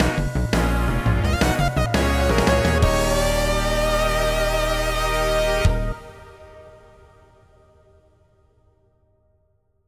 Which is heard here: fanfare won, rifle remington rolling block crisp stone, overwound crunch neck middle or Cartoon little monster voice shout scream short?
fanfare won